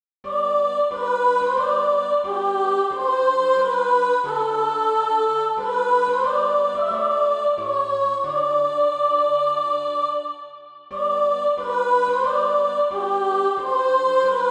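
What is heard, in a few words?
soprano, SATB Practise Music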